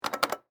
Arcade Game Button, Technology, Arcade, Game, Button, Push, Square, Multiple, Times; Blast Wave